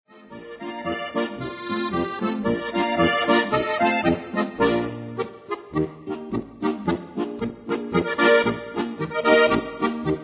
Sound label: polka